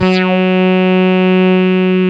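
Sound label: synth Morpheus; Sound & Vision - Gigapack II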